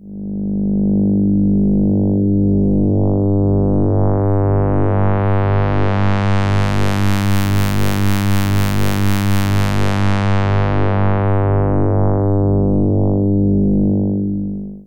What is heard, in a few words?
synth A cascade